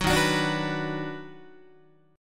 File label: D sharp Minor Major 7th Sharp 5th D sharp down Guitar; D#mM7#5 strummed; 9,11,9,12,10,10; 4A,4E,5A,5D,5G#,6C#